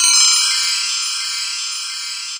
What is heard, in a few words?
Pad; Dream Zone